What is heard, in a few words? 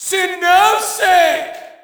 Shout, audience; UFC Tapout 2